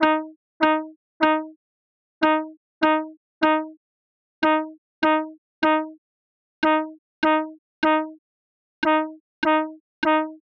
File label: Clarinet vs Trumpet